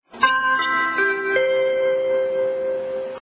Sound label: Gong